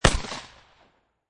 Shot Gun Remington 870 12 Gauge Slug Shot Single Shot Exterior At 45'